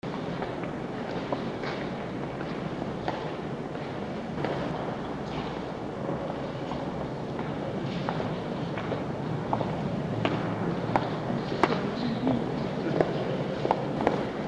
atmo locale large interior area with echoing footsteps